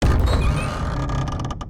gate open

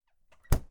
refrigerator close

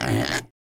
pig cry

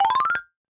sceneswitch

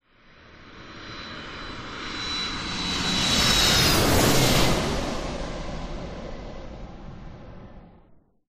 airliner pass

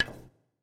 billiard shot

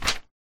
paper take

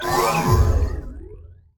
magic gravity

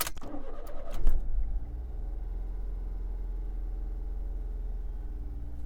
car engine

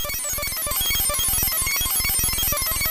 computer

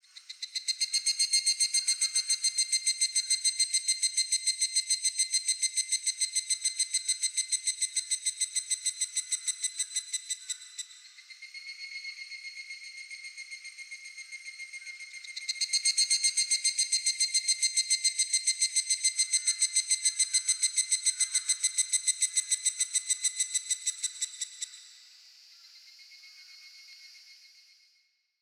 higurashi cry

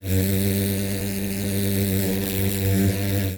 wasp fly

